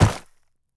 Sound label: rock strike; Rust